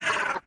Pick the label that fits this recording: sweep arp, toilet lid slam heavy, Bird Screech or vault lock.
Bird Screech